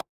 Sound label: ui pop